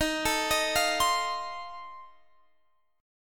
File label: F 7th D sharp